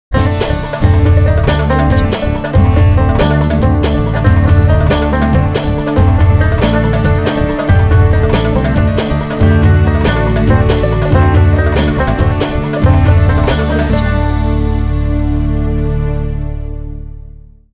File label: indian logo